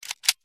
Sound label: Cartridge Dismantling Cup; MiSide Demo